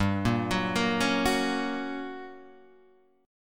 G Minor Major 7th Flat 5th, G, down, Guitar, Half Step Down